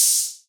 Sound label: Open Hihat; Daylight; Cymatics; Drum One Shots